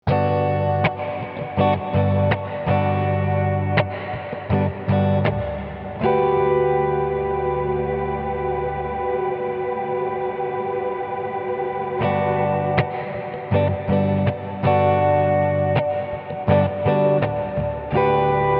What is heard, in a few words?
plate; BigSky; reverb; Distant Sustain